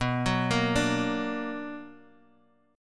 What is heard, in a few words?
B Minor Major 7th, Guitar, DADGAD